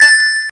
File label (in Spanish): lanzamiento